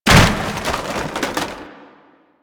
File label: Wood Crate Destroy; Warframe; NineteenNinetyNine